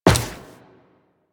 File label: Run Metal, Footsteps; Warframe; Tenno